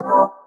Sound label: C STAB; Loopmasters; Funky Underground Vol 2